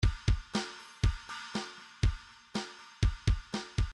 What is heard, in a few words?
midi file grooves rock